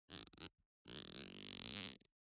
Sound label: industrial zip tie fasten slow